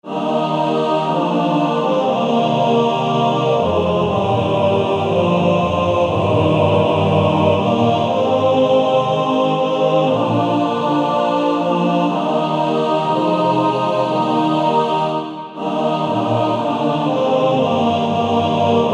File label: Male Choir; Joy to the World; TTBB